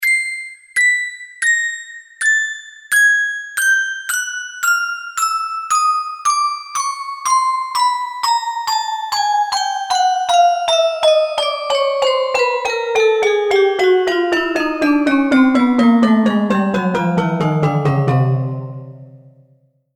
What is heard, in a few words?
ring my chord